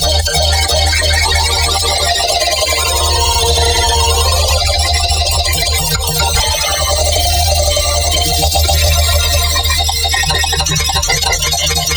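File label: magnesis granular processing Eb, sound design; base pack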